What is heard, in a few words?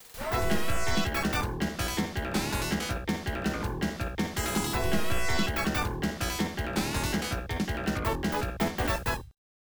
Completion Fanfare; Rad Mobile; System 32 arcade